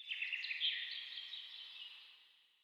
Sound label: terrain Ambient birds